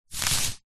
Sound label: warfare knife dig push slow into grass mud deep